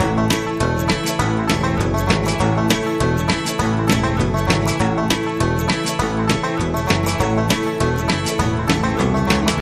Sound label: Hillbilly Bootstomp